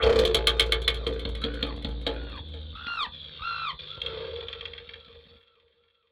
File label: fx beat the bush; sidsonic tubes